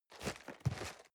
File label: cardboard box medium turn; objekte